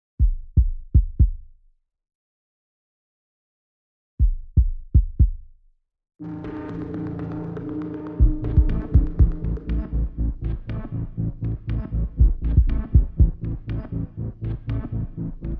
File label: Drums and Bass Only